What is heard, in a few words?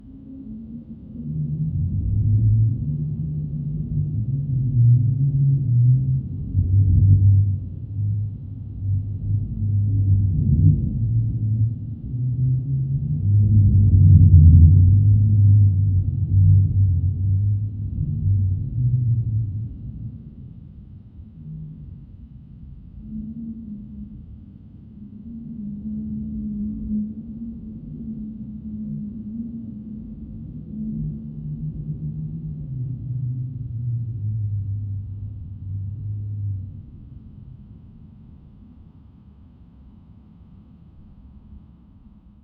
haunted mansion low drone, creaks